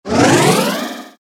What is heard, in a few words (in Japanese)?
能力向上 モノラル